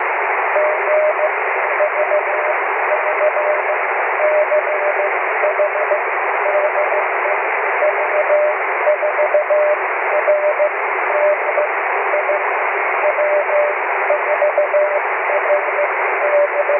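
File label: cw, 23 cm EME; w4rdi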